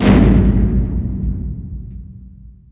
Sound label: door big open; doors elevators; Star Wars Obi-Wan; XBOX